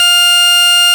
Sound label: FARFISA F3; Sonic Reality; Vintage Timetraveler